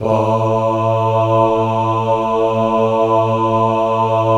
BAH B 1, Synclavier Strings; ILIO

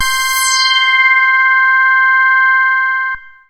MASS LEAD, Synth; InVision Interactive; Keith Emerson; The Most Dangerous Synth and Organ; SYNTH LAYER

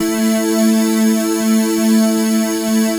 Dream Pad 5th; Keys; Pads; Drum & Bass

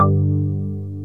PERC 5ths

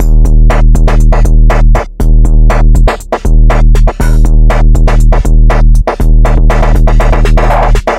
beat hip 120bpm

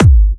Trance Kicks, Bassdrums; Vengeance; Essential Clubsounds Vol.4

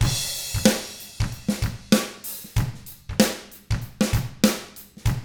95 FUNK, Drums; ILIO; Double Platinum Drums 1

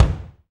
BD, Roland; Sound & Vision - Gigapack I

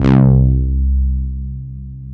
DS8 C 2, Korg; Back In Time Records; Korg Universe VOL-1

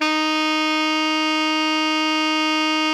alto FF D#3, saxophones; Greytsounds; Philip Wolfe David Crozier's Saxophones

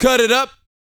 VOCAL F; ILIO

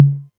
808 Tom Mid; Novation DrumstationV1

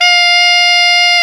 SAX XALTOS, Sax Sect.; Saxophones

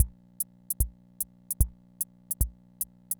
Kent K-220 Rhythm System Loop, drum machine

